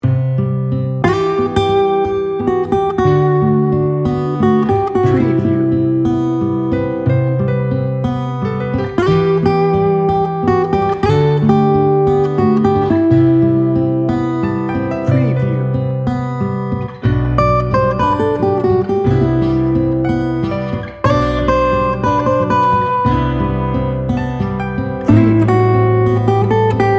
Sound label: warm and tender